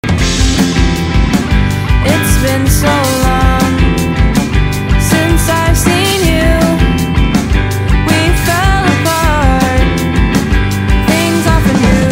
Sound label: indie